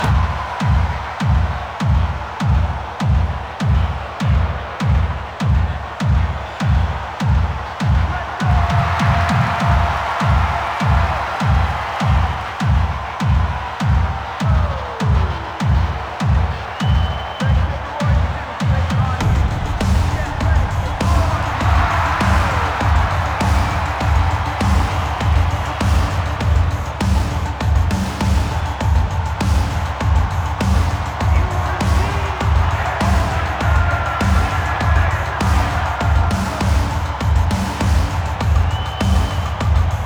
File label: Interior Stadium Ambient Music Loop; Nineteen Ninety Nine